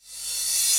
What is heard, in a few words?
Cymbals Reverse Crash; Vengeance Samples